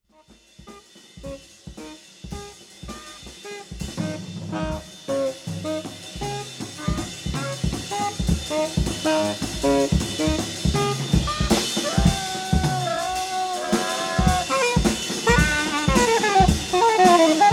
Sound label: Duet